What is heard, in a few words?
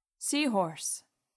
sea horse; bestiary; 5etools